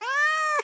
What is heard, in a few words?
cat